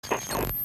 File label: Zap, TV